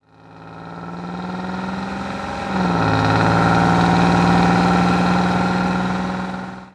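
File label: car driving; auto jed jed jed; Mafie 1 cz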